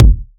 raw kickdrum sample g sharp key, Royality free steel kick drum sample tuned to the G# note